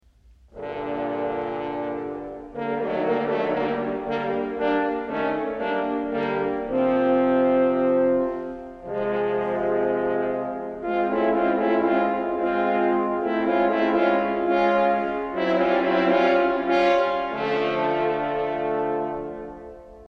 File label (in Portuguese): trompas